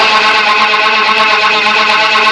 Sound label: oscillat; Sound Library 2000; Wayzata Technology; Music In